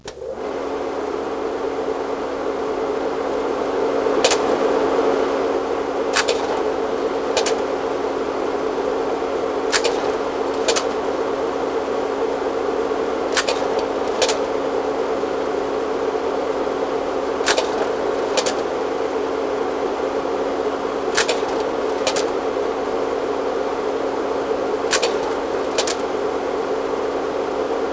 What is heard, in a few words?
projectr; WAV Sound Effects; office